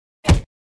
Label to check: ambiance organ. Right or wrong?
Wrong